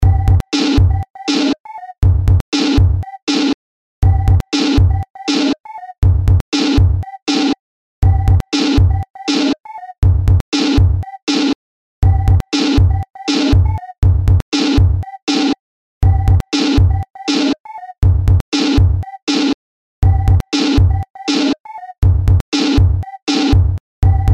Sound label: Sine